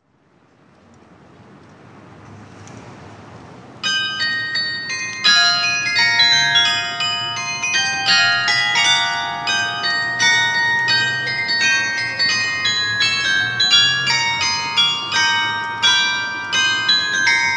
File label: carillon